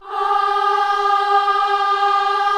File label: KIDS CHOIR, Gospel